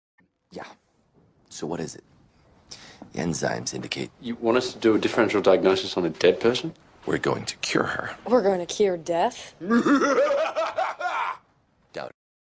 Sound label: evil laugh; house md